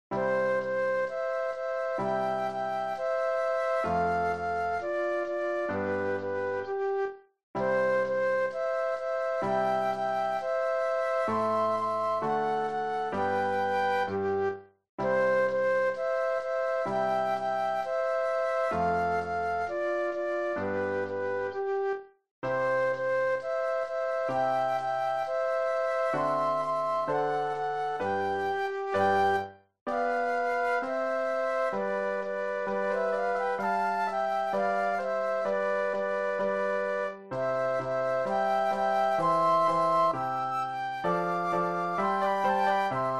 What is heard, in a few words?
Andante Flute Duet and Piano; classical; Haydn